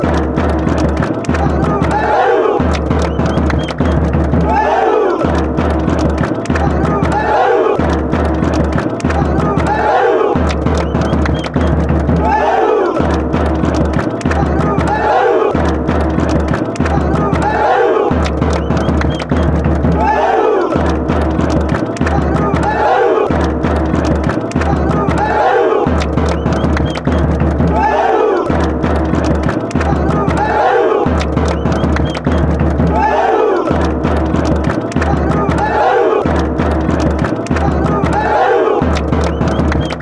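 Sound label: drums, football fan chants; peru; hua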